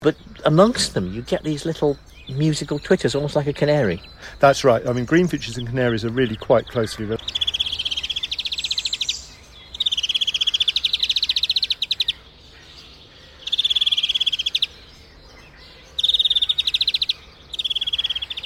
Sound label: greenfinch, birdsong